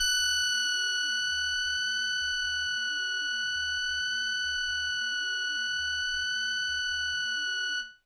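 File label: Indie Organ Kawaii F#0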